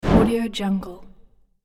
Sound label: page transition swipe swoosh